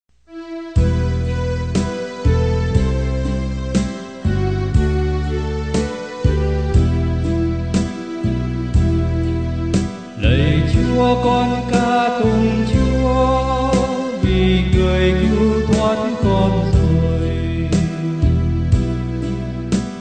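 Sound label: soprano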